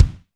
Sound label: HI GATE; Back In Time Records; Big Bang; THE HIP